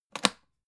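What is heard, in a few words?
aluminium case small close short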